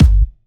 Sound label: 126BPM Kick; Vengeance Samples; Cubic Kit